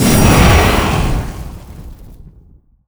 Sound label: Reaper Appear; MUDevs S20.1-3